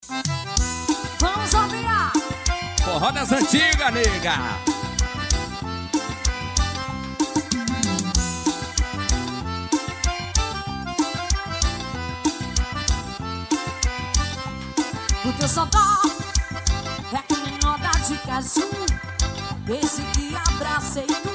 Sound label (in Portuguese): forró